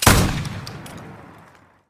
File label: shoot; EMXArms; emx draftsman